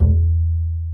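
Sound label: DBL HARM E2, double bass harmonic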